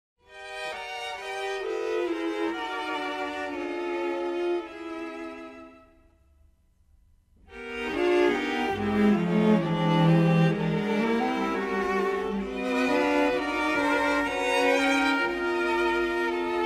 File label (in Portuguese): dueto